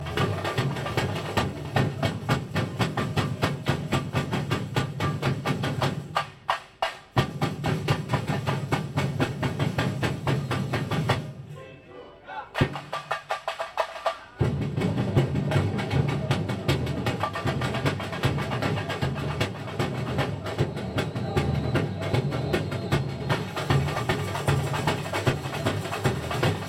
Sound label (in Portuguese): Break Ao Vivo; BlocoLoco; Tijuca; Rio